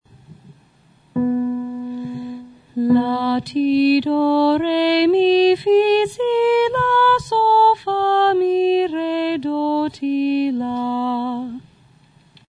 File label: melodic minor scale